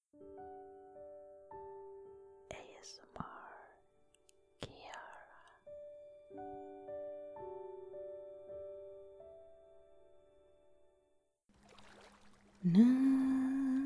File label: soft humming to relax to, waves; long